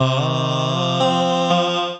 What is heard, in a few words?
Combined Rack (Root C), Basic Vocal Racks; Voice Box; Harmonic Vocal Racks